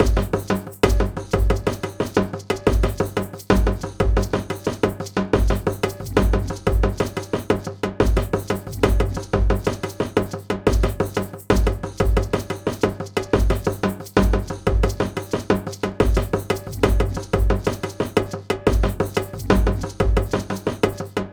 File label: World Kit Different More 90, Percussion Combos; M-Audio; Pro Sessions VOL-38; Abstract World Fusion 2